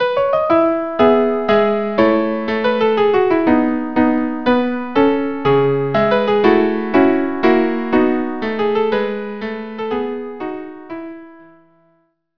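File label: violin viola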